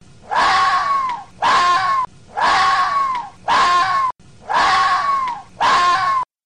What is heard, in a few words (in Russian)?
Zvuki lesnyh zhivotnyh - Lisa